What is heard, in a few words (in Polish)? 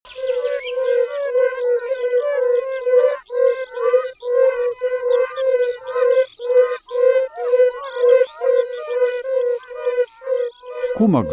Kumak gorski; Kurs PTTK; Przyroda; Tajemnice puszczy